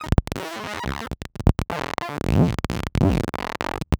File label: Bass drone, Circuitbend; Drones; 99Sounds; Mangling Audio; F (maybe)